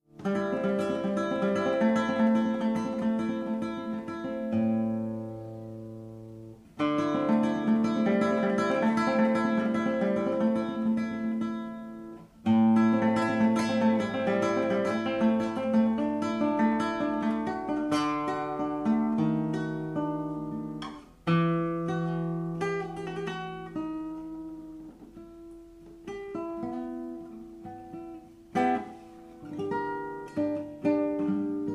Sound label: nylon no. 1